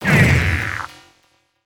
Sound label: game over